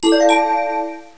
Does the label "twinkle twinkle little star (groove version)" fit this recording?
No